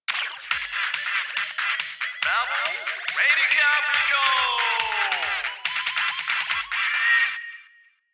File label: Radio Jingle